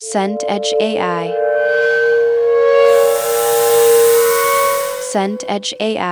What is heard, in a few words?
drone metallic